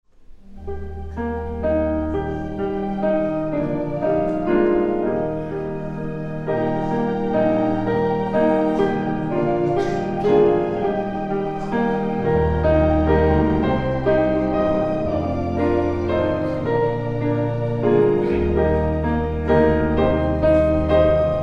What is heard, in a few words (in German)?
Vorspiel